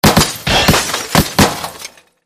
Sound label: Glass Tube Shatters, light bulbs